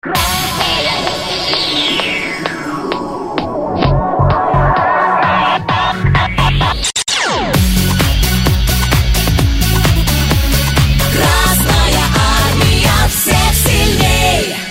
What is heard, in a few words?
pop